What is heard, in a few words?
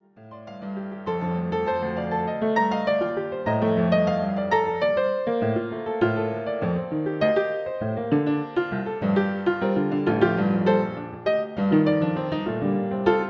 piano test